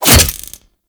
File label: Throwing Taser Sticky Bomb Adhere; Tenno